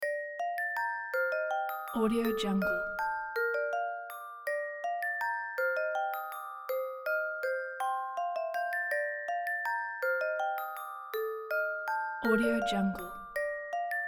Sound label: german dance musicbox